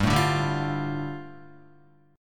G 7th Suspended 2nd, Guitar; strummed; JGuitar

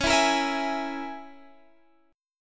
C sharp Minor 6th C sharp, Guitar Standard; C#m6; strummed; JGuitar

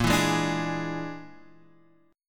Bb 7th Flat 5th Bb down, chord; Bb7b5; strummed; JGuitar

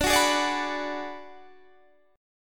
D 6th Flat 5th D down Guitar Standard, chord

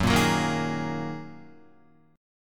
Gb 6th Add 9th Gb down Guitar

